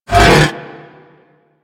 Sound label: Derelict Mover Malfunction Start; Orokin